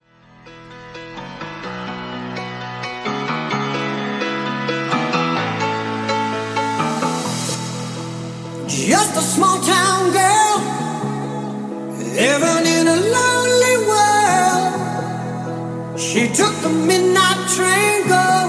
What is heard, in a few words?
Pop Reboot; Remixfreakz